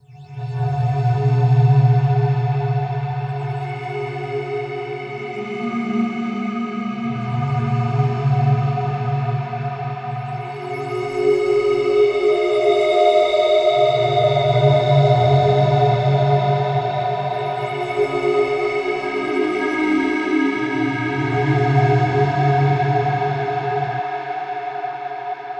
Crying Crystal, Drum n Bass, Loops, Pads; KJ Sawka; Drum n Bass & Beyond

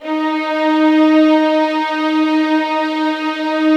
D#4, soft violins; Q Up Arts; Denny Jaeger Master Violin Library

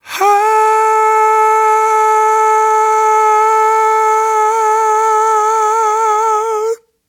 AHHH, Vocal; Polyend

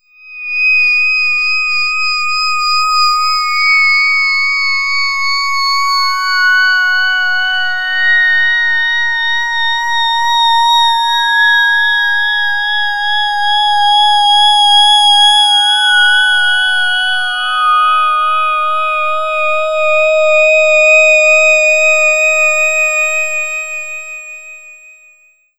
FM Solo; Melodic; Sonic Foundry (Sony Creative Software); Slow Silhouette

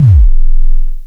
KICK LO, Klone Dual Percussion Synthesiser

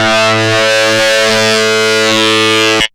HARDSYNC A2, LEAD SYNTH; Best Service Alpha Dance vol.2

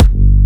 Jump Kicks, Bassdrums

